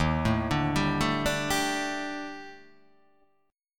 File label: D sharp Major 7th Flat 5th, down, chord